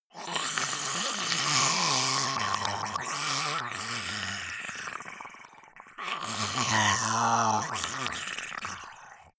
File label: monster choking zombie idle high; comedy-cartoon; soundpool